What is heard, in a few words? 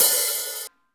HH, Kit s; Real-Kit s